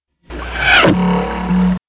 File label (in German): pfeil